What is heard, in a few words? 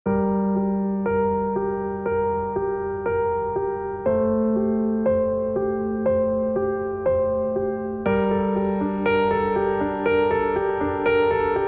Piano Only